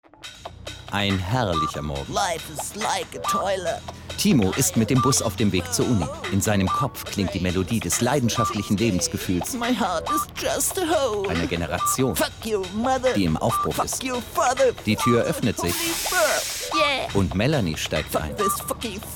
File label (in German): Musik